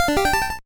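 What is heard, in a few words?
Jingle, video game; Serious Sam Advance; GBA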